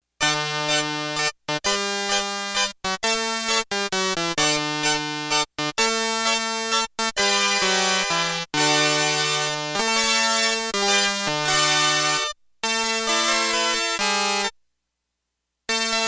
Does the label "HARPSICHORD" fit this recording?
Yes